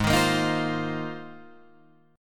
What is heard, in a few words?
Ab 6th Add 9th Ab Guitar Drop D